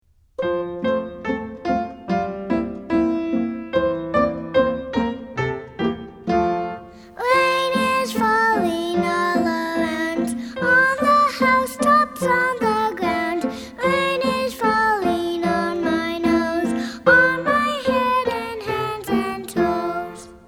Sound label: rain is falling all around words and music